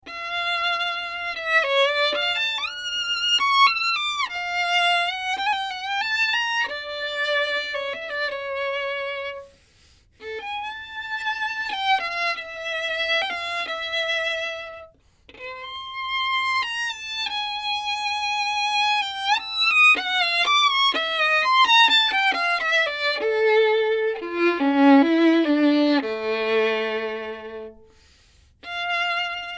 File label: Violin